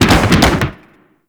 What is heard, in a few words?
Slide Boost Backfire, Motorcycle; Warframe; Vehicles; Motorcycle Ninety Nine